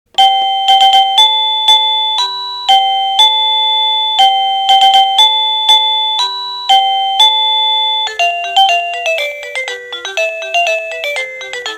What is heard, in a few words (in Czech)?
melodie